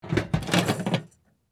Tactical gear box open

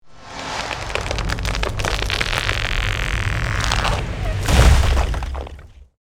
Nature magic spell boulder smash

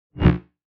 Sci fi energy ball short futuristic

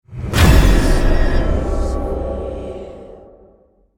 Scary horror jumpscare

